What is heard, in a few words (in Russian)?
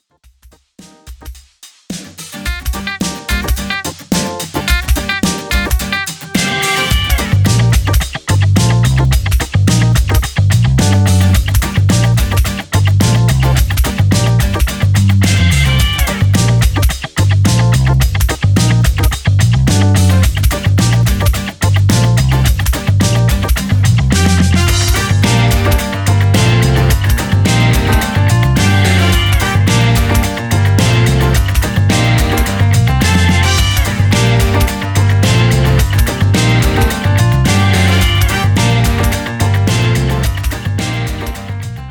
Музыка в стиле Funk